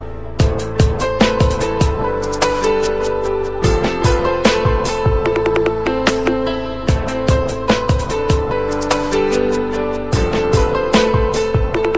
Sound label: Piano Mix